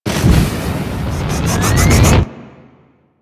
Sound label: Fire Vent Charge Up; Warframe; Ambience; Corpus Gas City; Gameplay Remaster